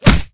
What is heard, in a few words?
bullet light ballistic flesh; Titanfall 2